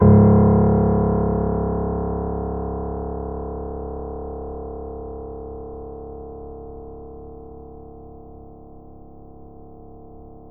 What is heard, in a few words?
Grand Piano C#0 mf; Ableton Live 9 Essential Training